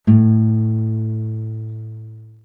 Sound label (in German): gitarre a5